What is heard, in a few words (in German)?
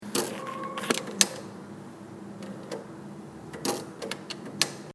Geldautomat Karte rein